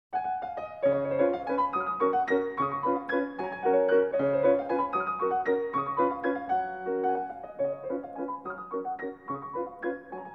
Waltzes for piano, G flat; Chopin

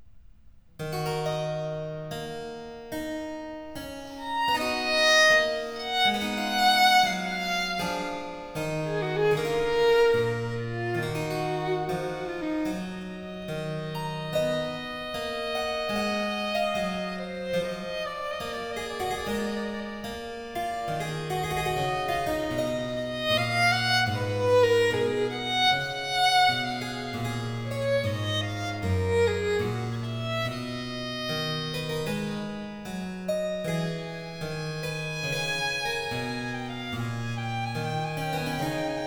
Sonata for violin & keyboard in G major, Largo; BWV 1019; Bach; Sonatas for Violin & Keyboard

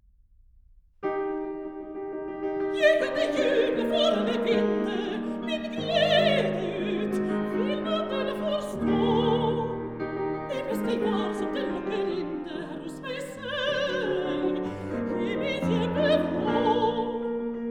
song for voice & piano

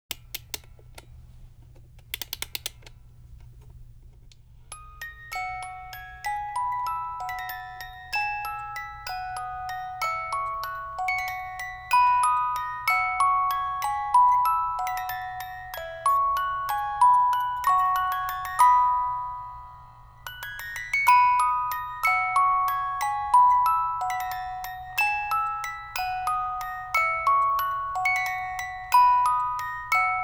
Intro (Music Box)

